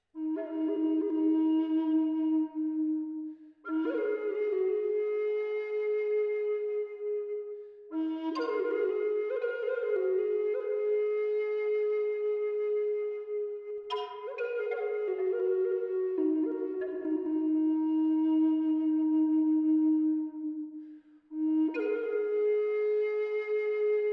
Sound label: Bubinga E